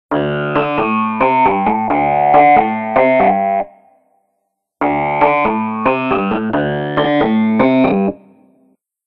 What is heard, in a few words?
Alarm; Windows Mobile 6.5